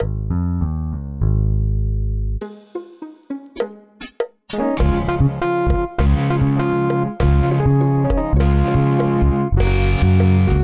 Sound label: Jingle Bells